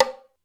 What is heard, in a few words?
Hollow, Drum Machines Alesis D4